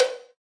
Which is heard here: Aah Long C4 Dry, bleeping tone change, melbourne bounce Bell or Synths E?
melbourne bounce Bell